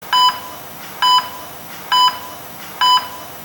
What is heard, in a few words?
ecg 3500